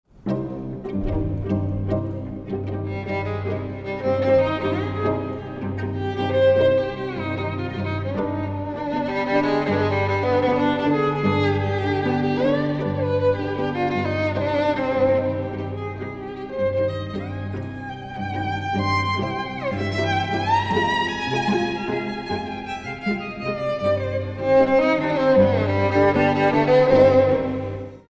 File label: tango